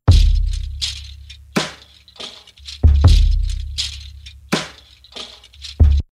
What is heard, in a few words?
162 drum loop gentle hooves